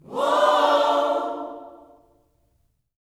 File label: WHOA OHS, Gospel; Spectrasonics; Vocal Planet Vol.1 Gospel